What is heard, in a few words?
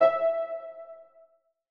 bar new order